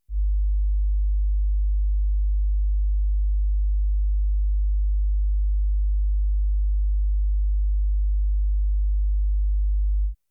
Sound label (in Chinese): 讯号测试 56Hz